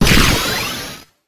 weapon charge up; weapons

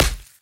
player bullet hit

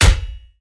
metal punch hit

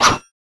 weapon dagger stab

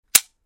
strobe click

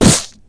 pinata hit

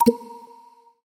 ping danger

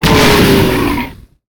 monster orc death

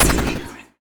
monster hurt

